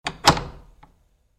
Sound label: Latch, Metal: Slide Into Place; Latches; Objects; Stuart Duffield